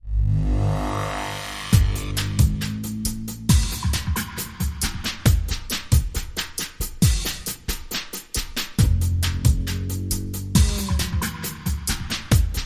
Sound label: Light Ambient